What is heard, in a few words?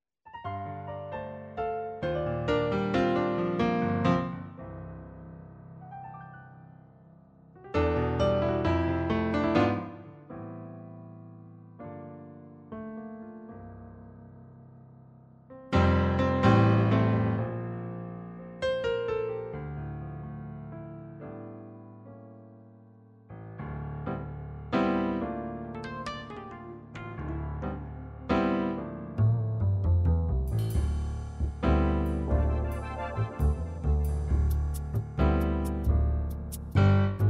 rd-600; MIDI environment from the 1990’s